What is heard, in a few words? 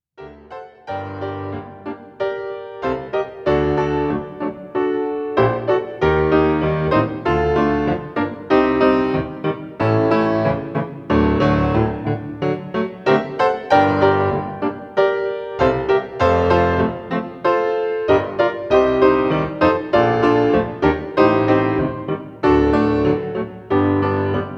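Ballad, piano; wedding; Brahms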